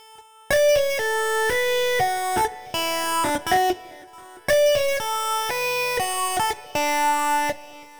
Solo Synth, 120bpm D; Construction Kits; Zero-G; Nu Disco; Happy People

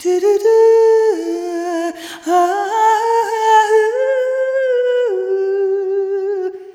Arrhythmic F; Sonic Foundry (Sony Creative Software); ILONA

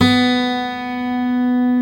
GUI AC.; Sound & Vision; Gigapack I CD 2; GUI ACOUSTIC 1

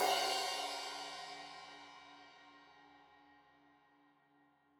Crash 15in L edge, Cymbals Stereo

